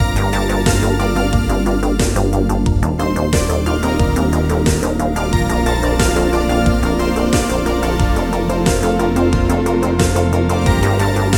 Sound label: MUS LOOP